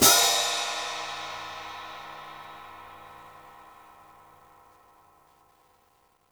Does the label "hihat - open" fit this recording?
Yes